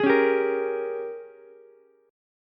Ab Major 7th Suspended 2nd Suspended 4th Ab, down, Ukulele; strummed; JGuitar